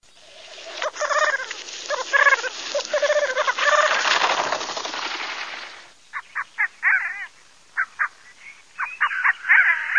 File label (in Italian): GALLO FORCELLO, birdsound